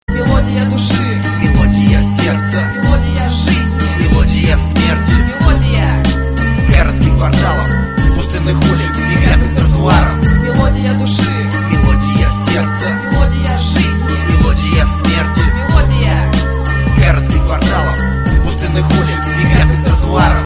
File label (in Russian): Melodiya